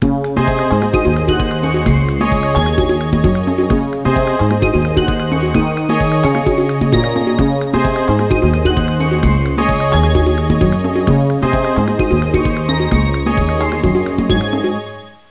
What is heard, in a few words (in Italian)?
suonerie contemporanea; musica contemporary